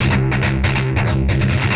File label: Treat Guit, Industrial Nasty